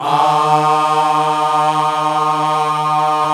GOSP. AAAHH; Sound & Vision - Gigapack II CD 1

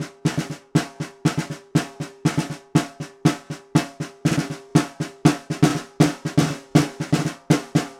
Vintage Ludwig Snare, Percussion; Continental Drift Aftershocks

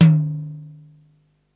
tom, Yamaha RY-8

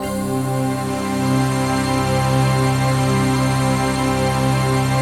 CHRD PAD; Best Service; XX Large Pads; Sample CDs

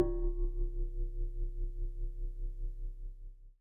C1, Synth Line

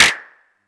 vermona drm1 mk3 clap

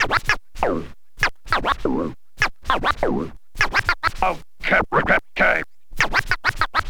scratch med, Vinyl Attack scratches mix

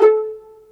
PIZZVLN E3; AKAI S-Series CD-ROM Sound Library VOL-2

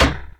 ELECT PERC; OMI - Universe of Sounds

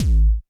BD, Bassdrums, Roland TD-20

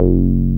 BAS 303 F2#

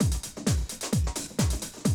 130, Classic DrumLoops

